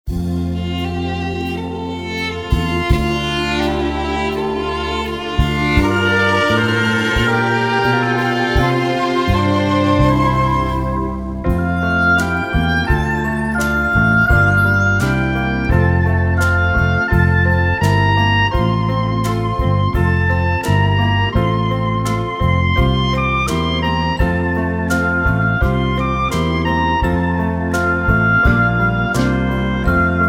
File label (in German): Bruder Jakob Karaoke